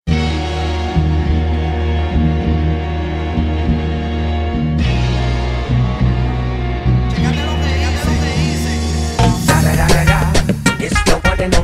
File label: Tribal